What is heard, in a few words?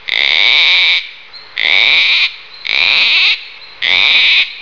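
Gang Gang, Cockatoo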